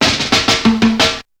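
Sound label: SNARE ROLL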